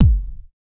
small reverb kick G key

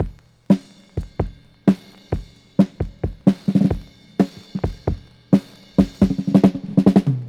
132 bpm drum beat f sharp key; drum loop

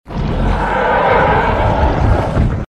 roaring, big wild animal

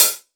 sizzle high hat c key; tuned drums

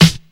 low acoustic snare sample e key

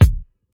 prominent kick drum single hit c sharp key

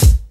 bright bass drum sound G sharp key

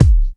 natural bass drum one shot d sharp key; kick

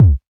raw kick one shot d sharp key; kick drum samples; one shots

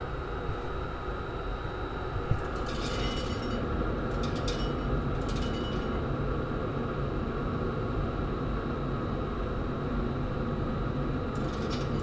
pellet boiler burning and feeding pellets

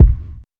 hot steel kick drum sound e key

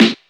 natural steel snare drum sound a sharp key; Duration 0:01; Channels Mono

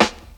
hip hop steel snare drum sample a key

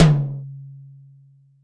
high tom sample c sharp key

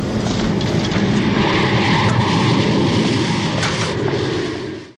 airplane engine roaring

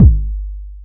long bass drum g key; kick drum samples

